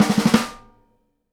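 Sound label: snare stroke hi; E-MU Producer Series Vol. 8 – Platinum Phatt; Live Snares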